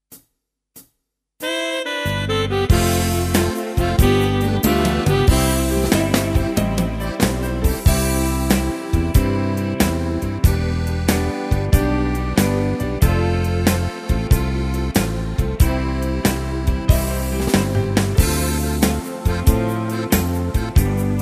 Slowrock, tyros2; Danse Band 2

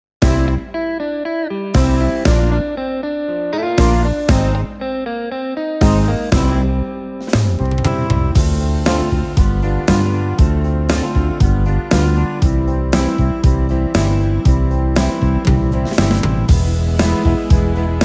Shoop Beat; tyros5

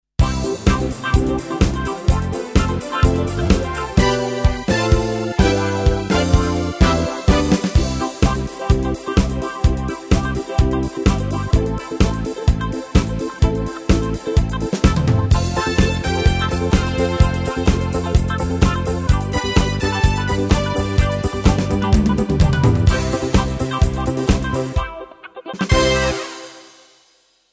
Disco, tyros2

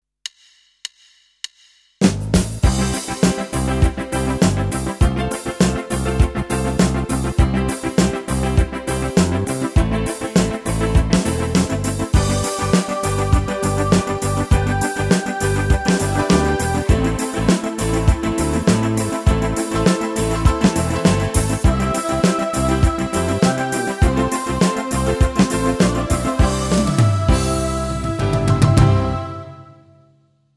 Swedy Disco; tyros2

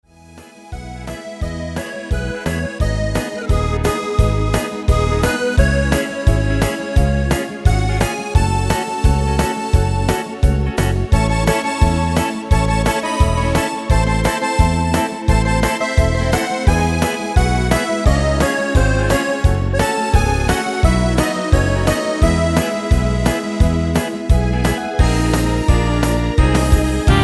Sax Medley; Tyros 5

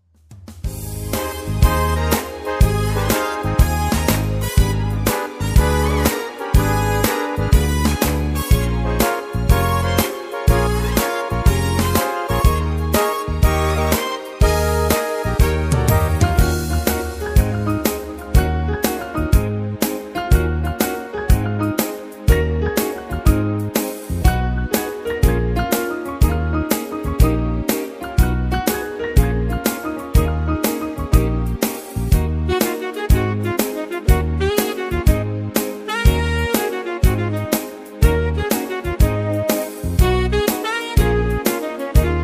Morning Train, Tyros 3

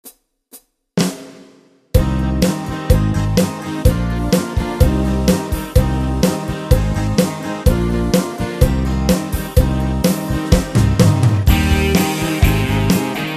Country Hit, tyros2

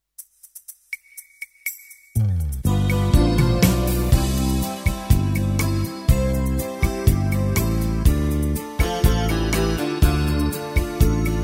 Scandinavian Beat; tyros2

